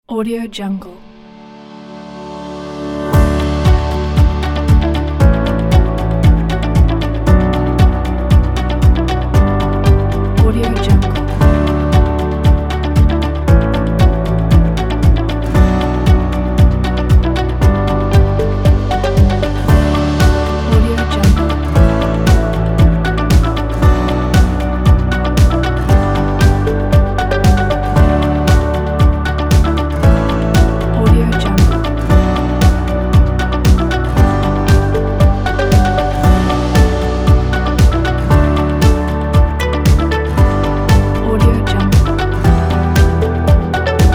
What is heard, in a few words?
corporate inspiring and uplifting; daniel--st